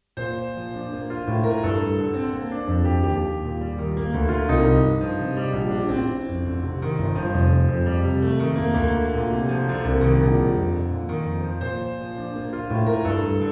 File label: piano pop